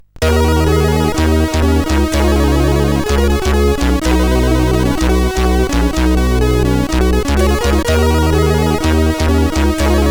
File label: Oldline3, CSG8580R5